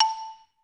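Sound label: XY LONG A4, xylophone; Best Service; Peter Siedlaczek's Advanced Orchestra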